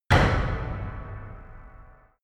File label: metallic hit; impacts; hits-explosionen